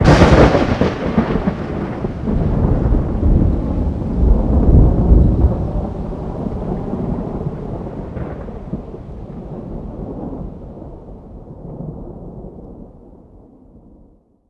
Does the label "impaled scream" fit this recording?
No